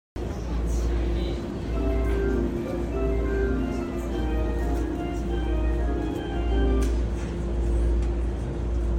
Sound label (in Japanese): 武蔵野線 東松戸 東, train sounds; JR